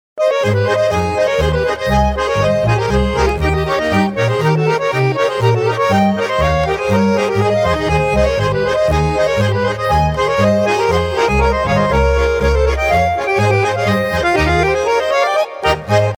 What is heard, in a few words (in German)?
Schottisch